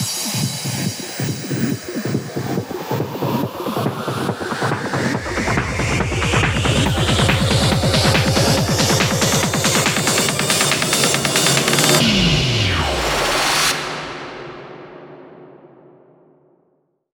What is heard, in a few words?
140BPM 8Bars, HandsUp & Trance Fills; Blaze; Vengeance Samples